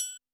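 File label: TRIANGLE SHORT, Yamaha SY85 Drums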